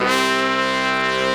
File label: BRS, R&R Horns